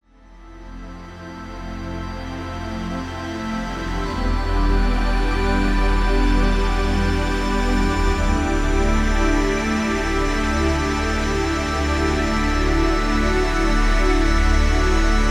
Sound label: G No Lead Guitar